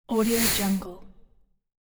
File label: whoosh; minimal studio